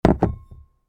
Put plate

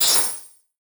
ice magic small; 魔法効果音

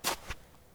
footsteps snow

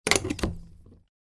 Cocktail shaker lid

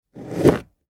Cut a lemon

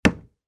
footsteps wooden floor; 足音

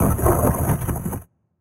earth magic small; 魔法/法术的音效

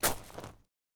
footsteps gravel

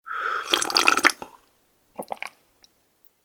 Sipping coffee

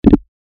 move

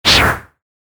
acceleration

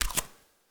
Footsteps Barefoot One

